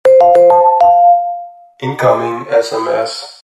Incoming Sms

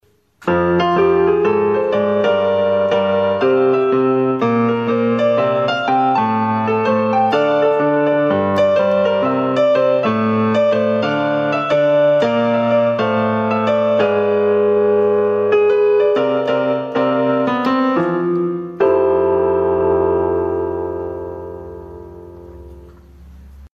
Improvisation

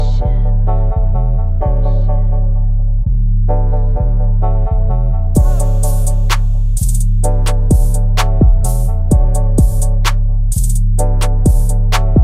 type beat

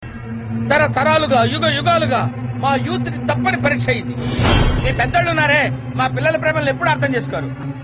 Kick Telugu

